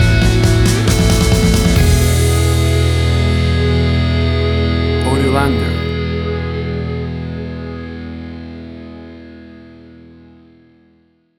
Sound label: 90s Classic Punk Rock Music Stinger